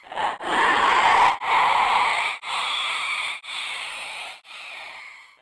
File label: breathing, stalker; Half Life 2